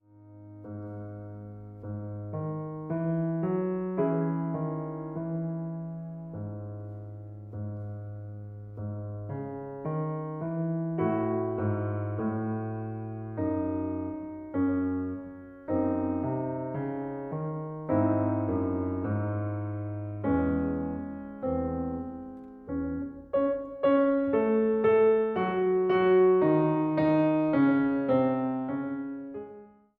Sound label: VIOLIN